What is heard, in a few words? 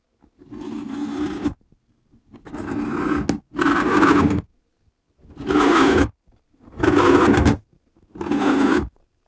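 a heavy object being dragged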